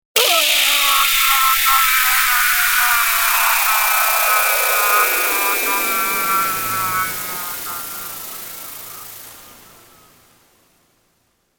Vaporized Man, 3 x vaporising effects.; Battle; Vaporizer

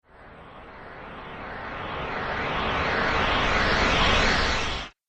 Sci Motion Flyby, whoosh sound effect; Andrew Fleming

